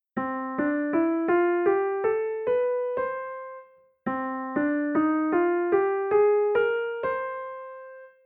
Parallel keys

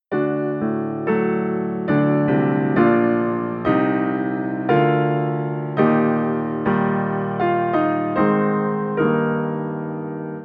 Half step modulation

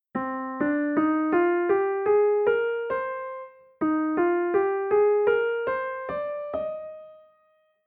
Relative keys

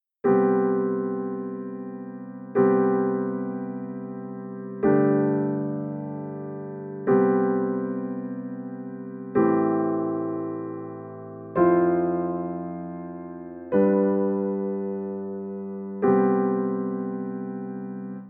Cadd7

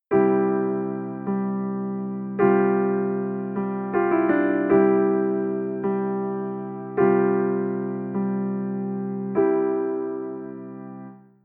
Suspended tonic

